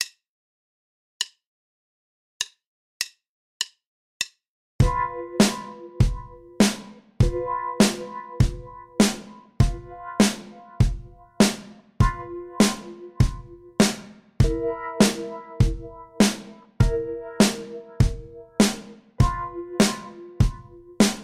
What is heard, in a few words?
12 Bar Blues In G no Bass